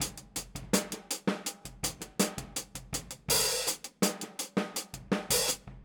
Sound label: Boogaloo, Hi-Hat, 82 BPM; Drums; Verse; Sonic Foundry; Drums from the Big Room The Mixes